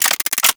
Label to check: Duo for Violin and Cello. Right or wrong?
Wrong